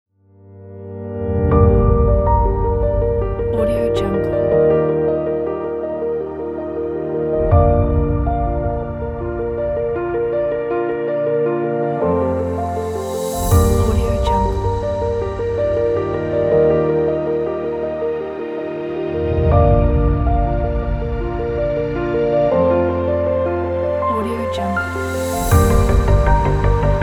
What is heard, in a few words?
cinematic intro